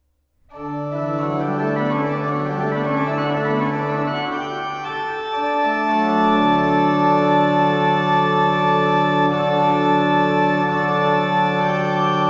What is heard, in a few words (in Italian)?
intonazione, organ & harpsichord; Intonazioni del quinto tono; Andrea Gabrieli; Complete Keyboard Music